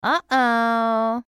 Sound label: Wrong